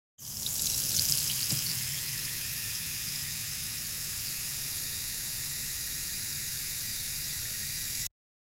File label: the delicate sound of the; domestic